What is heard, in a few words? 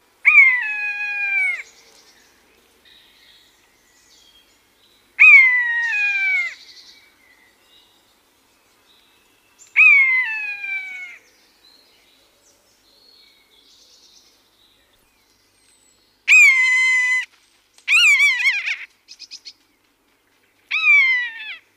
Common Buzzard